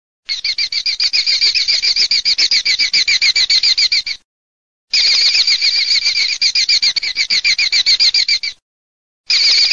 FALCO LODOLAIO

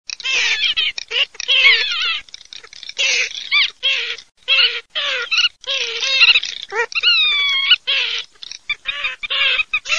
MARZAIOLA PITTIMA

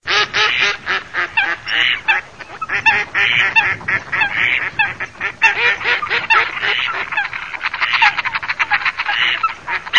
GERMANO FOLAGA

